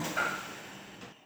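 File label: Emergency Brake, Train; R142; OpenBVE; Jerome Yard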